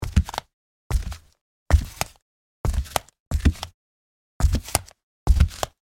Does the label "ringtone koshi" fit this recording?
No